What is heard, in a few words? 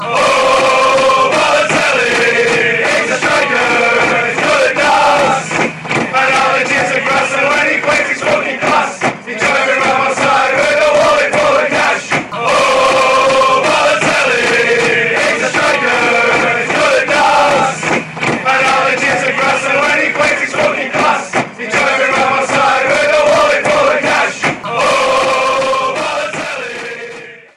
oooooooo, fanchants; balotelli